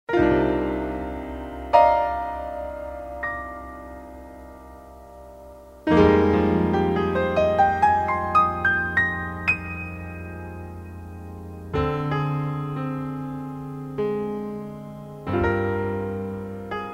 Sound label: instrumental